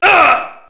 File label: get hit; characters